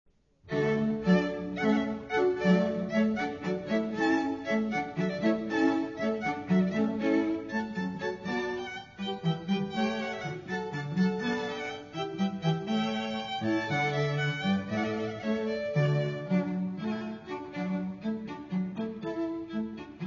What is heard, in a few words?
Quartet Ceremony hornpipe; handel